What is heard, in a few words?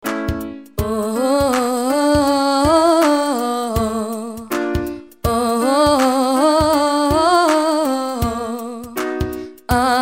Exercise mixed 5 tone scale